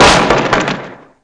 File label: crate; Kingpin